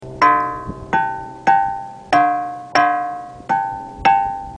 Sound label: Bell Rock Sounds